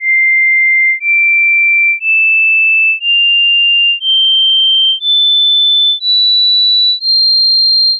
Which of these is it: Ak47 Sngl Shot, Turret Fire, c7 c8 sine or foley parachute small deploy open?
c7 c8 sine